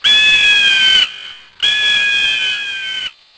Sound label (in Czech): orel